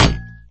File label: thermal detonator bounce; lego star wars